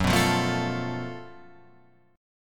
F sharp Minor Major 7th Double Flat 5th

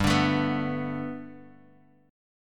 G Major Add 9th, Guitar, Drop D; chordshape; down

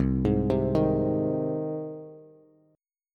C Minor Sharp 5th C down Bass; arpeggiated; JGuitar

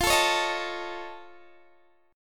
F Augmented 7th F Guitar Standard, chord; F+7 strummed; x,20,19,18,16,17; JGuitar

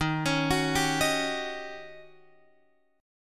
D sharp 7th Sharp 9th D sharp Guitar Open F; D#7#9; arpeggiated; chord shape; x,10,13,13,10,10

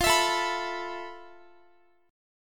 F Major Sharp 11th, Guitar; strummed

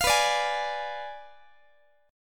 B Diminished 7th B down Guitar; strummed; tuning 4A,4E,5A,5D,5G#,6C#

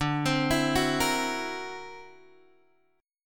D Augmented 9th down Guitar Open G

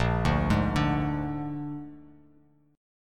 Bb 7th Bb down, chord; arpeggiated; JGuitar

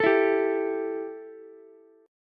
E Minor Double Flat 5th Ukulele; 14,16,15,x; strummed; JGuitar

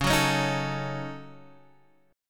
Gb Minor 6th Db

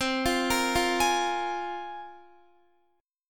C 7th Suspended 4th Sharp 5th, Guitar; C7sus4#5 arpeggiated

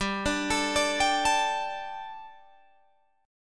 G Suspended 2nd, Guitar, Drop D; chordshape; down

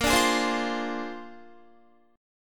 A sharp 9th A sharp Guitar; strummed

